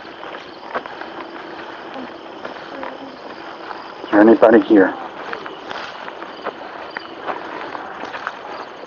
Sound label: Man Yelling